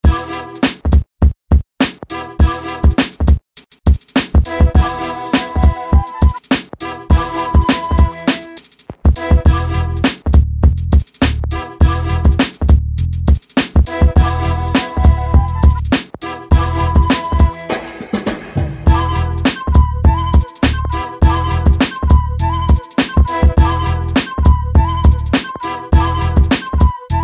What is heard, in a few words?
pistalsong instrumental